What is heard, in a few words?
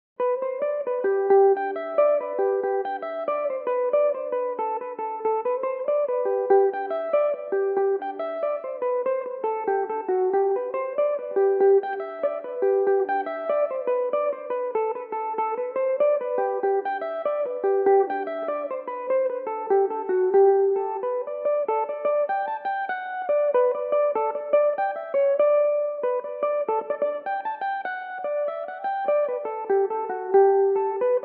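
G major belmuse, irish mandolin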